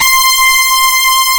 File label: BRASS C5; AKAI S-Series CD-ROM Sound Library VOL-2